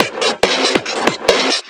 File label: Breakbeat; Vengeance Samples; VEC2 Loops